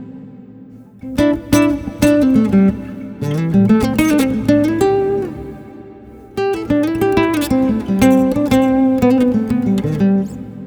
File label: Acc Guitar Solo